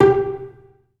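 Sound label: solo pizzicato, Strings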